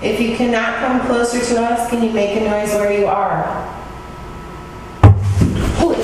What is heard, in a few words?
big bang